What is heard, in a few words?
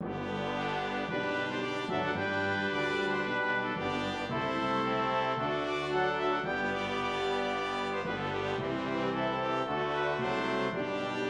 Brass; And Can it Be (Sagina)